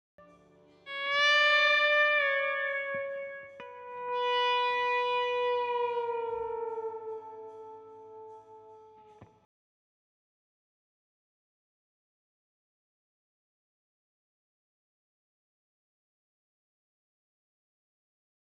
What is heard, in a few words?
Melody funk end